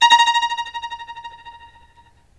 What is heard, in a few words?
STR Violin, Solo Violin FX